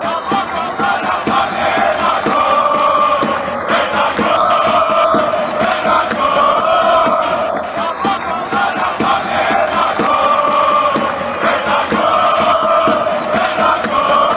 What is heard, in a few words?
chant; appolon